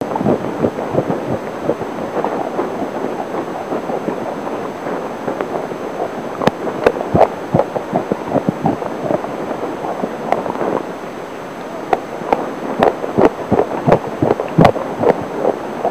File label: feeding